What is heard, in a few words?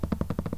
skitter